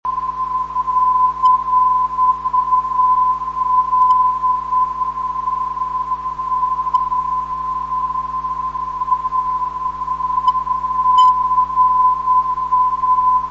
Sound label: cal, ndb